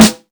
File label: Snare Blue Room